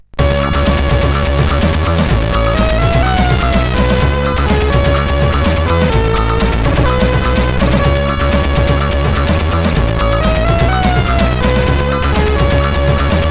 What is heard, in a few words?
tune, sid 8580R5